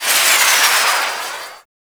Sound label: Swarm Missile Projectile; Weapons; Swarm Missile Launcher; Warframe